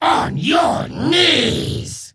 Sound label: special, creature; Shadron